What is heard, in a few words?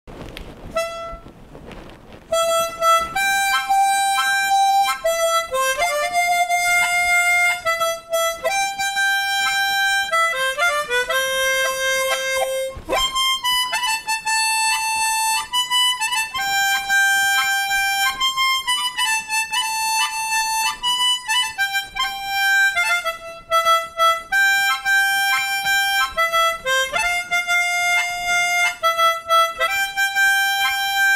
Harmonica song